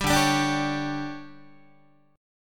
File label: D Major 7th Suspended 4th, Guitar; DM7sus4; strummed; JGuitar